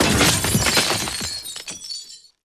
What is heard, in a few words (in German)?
GLAS FX; Best Service; Advanced Mega Effects